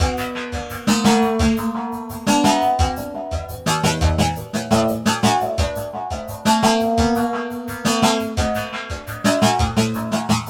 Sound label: Drum Loop, Drum Loops